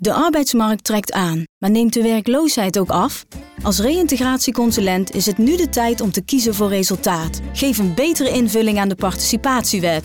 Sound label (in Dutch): Muziek Zachter; Bijstand naar Werk; Nieuw Begin